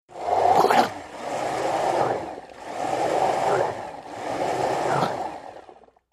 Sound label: Blender Fst Pulse Vry